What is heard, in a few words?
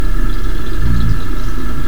Boreogadus saida Grunt; Polar cod